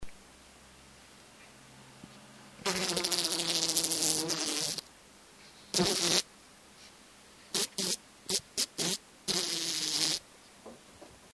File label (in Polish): mucha